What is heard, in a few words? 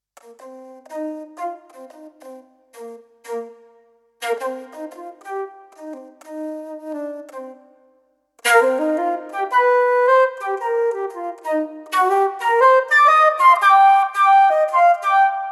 Flute solo